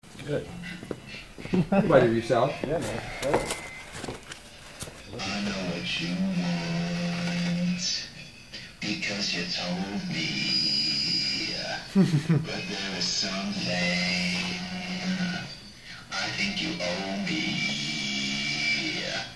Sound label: Vocal Dump